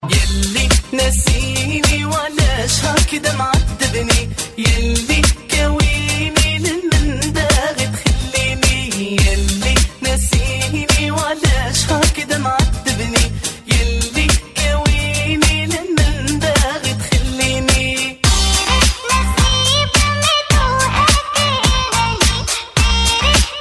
arabic dj ringtone

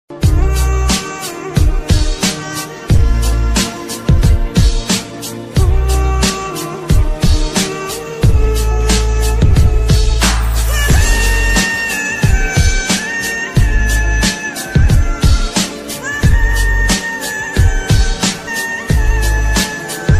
english love ringtone

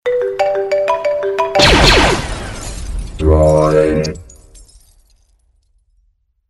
iphone killer ringtone

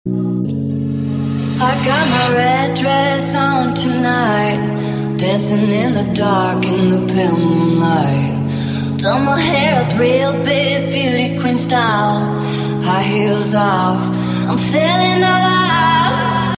ringtone

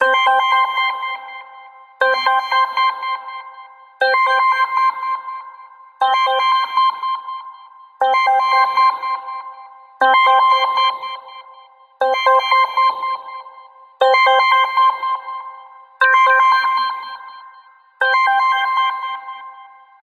vintage ringtone; droid

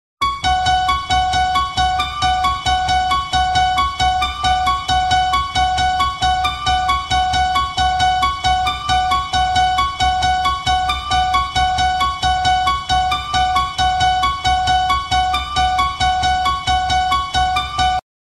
ringtone

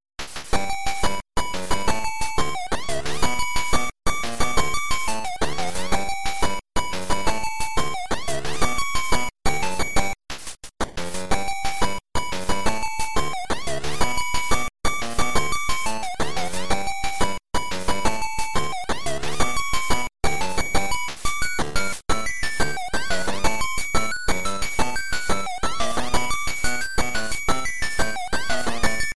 game boy ringtone; donkey kong

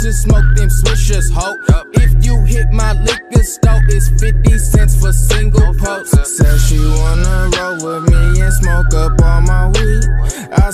new hip hop ringtone

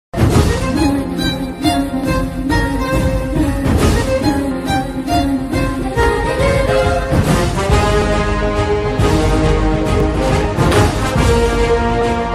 instrumental ringtone

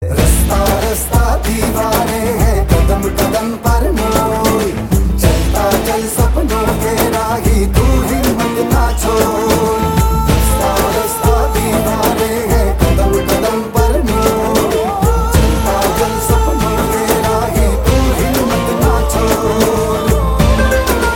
hindi movie ringtone

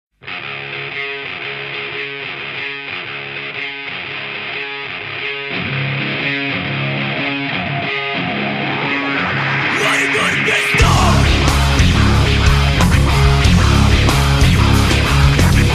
indonesian ringtone

